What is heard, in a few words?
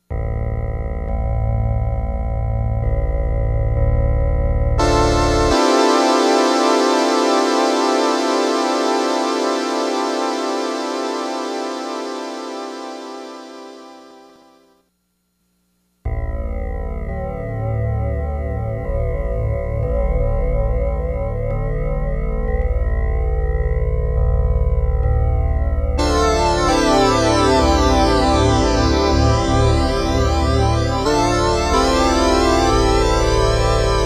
tri, multiphase; Scott Stites